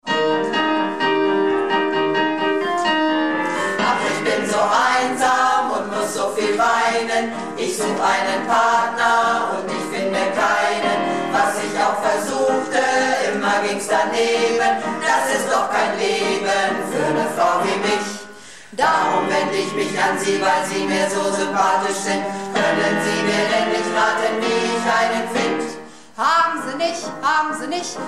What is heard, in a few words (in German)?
Bass, Probe